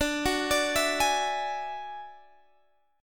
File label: F sharp 7th Suspended 2nd Sharp 5th, D